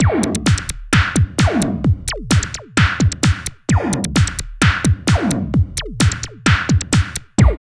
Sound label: LOOP, Tekno Industrial; East West